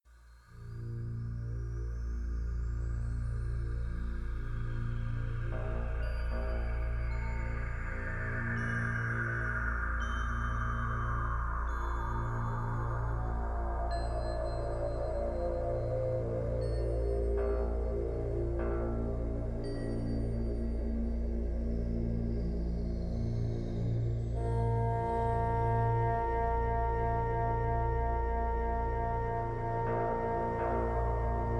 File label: Powerful Theta Brainwaves Zen Meditation Music